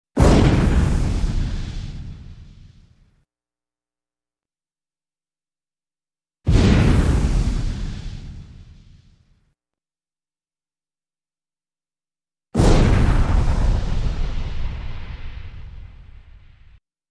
Explosive Fire Burst, Good For Catapults, Fireballs, 3x